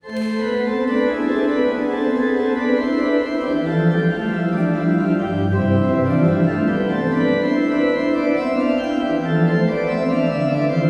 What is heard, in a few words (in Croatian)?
ORGULJE